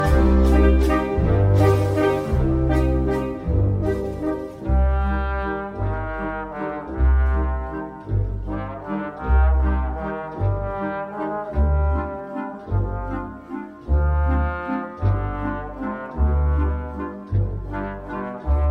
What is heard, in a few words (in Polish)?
waltz no 2 solo puzon